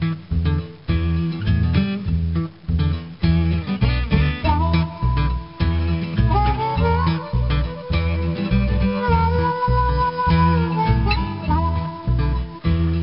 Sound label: Bkg Music